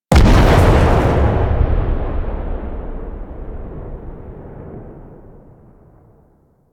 Air Bombard Explosion; Warframe; Venus; Venus Air Bombardment

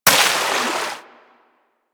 Water Sprayer Initial Shot; Warframe

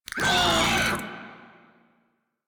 Desert Skate Vocal Pain C; Warframe

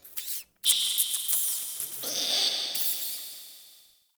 Crypt Rat Vocal H; Ambience; One Offs; Champion Crypt

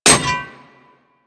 Melee Axe Impact Generic; Warframe; Weapons; Axes

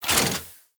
Mech Move Foley; Nechro

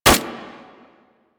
Fire Mech, Weapons; Warframe; Braton

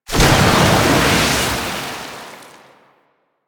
Tidal Wave Cast; Warframe; Warframes; Pirate

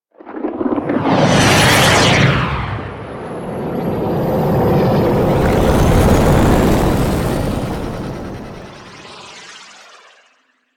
Fly By Exterior; Archwing Intro Cinematic; Liset

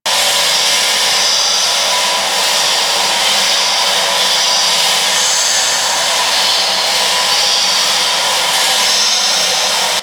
Vent Fog Loop; Ambience; Props; Warframe; Corpus Railjack

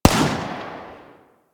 Heavy Pistol Shot 3D; Warframe; Eidolon; EidolonGrineerMarinePistol

